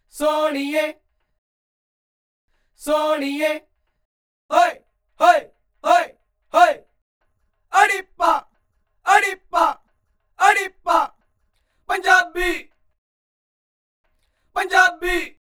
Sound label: backing vocals samples